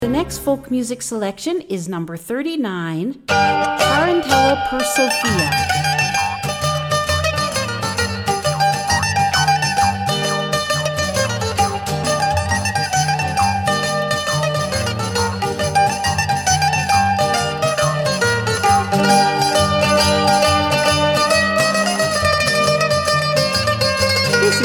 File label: Tarentella